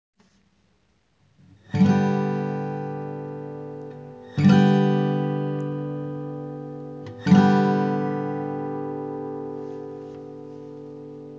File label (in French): sol accord facile guitare